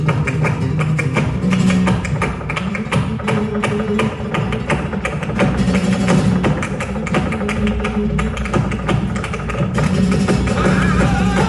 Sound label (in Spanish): letra solea x buleria